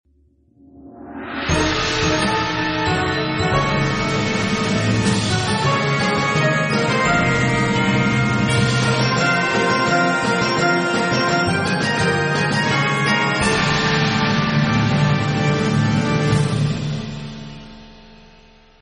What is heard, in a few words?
Fanfare